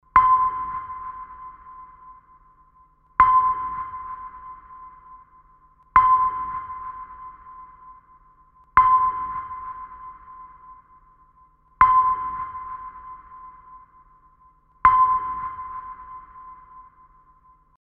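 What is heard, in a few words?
echo sounder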